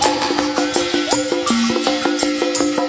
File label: 83 bpm whistle scratch conga